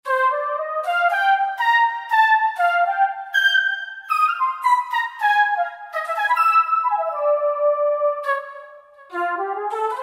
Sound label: Lyric Flute Solo, Roland JD-990; Factory Patches